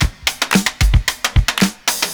PERCS, 70 s Breakbeats; USB Soundscan vol.46; AKAI

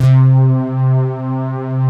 WARM PADS; Pro-Rec

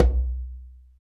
PER Percussion

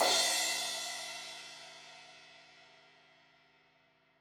Crash 16in edge, Cymbals

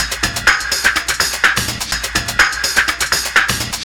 funky train, Deep House 125bpm

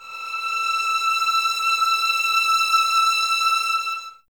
E 6, 11 Violins, Soft; Miroslav Vitous

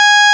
P6 SUB, P6 SAW, Vintage; INIS

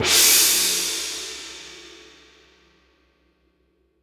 Gong; Roland SPD-S

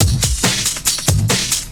LoFi Beats, 140 BPM

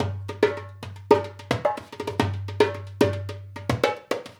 Dumbek; Essential Percussion

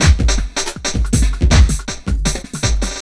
JUNGL 160, JUNGLE LOOP

